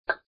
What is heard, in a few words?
pop